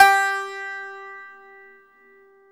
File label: TZOURAS G 3, Mediteranean Traditions; Soundscan vol.53; AKAI